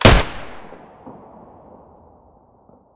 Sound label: muzzle p99 stereo, weapons